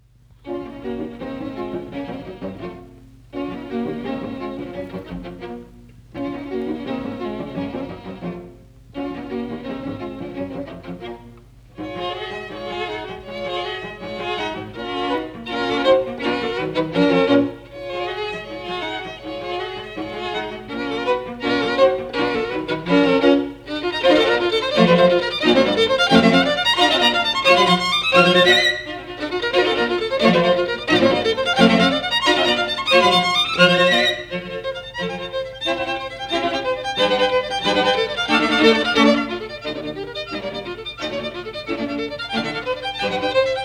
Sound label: String Quartet No.13 in B flat, Presto; Beethoven; Op.130; II. Presto